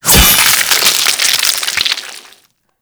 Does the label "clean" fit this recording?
No